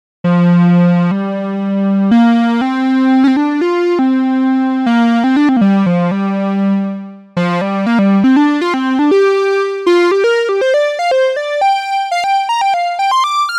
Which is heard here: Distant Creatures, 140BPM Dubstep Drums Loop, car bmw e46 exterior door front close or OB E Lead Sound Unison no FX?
OB E Lead Sound Unison no FX